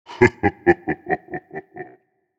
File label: laugh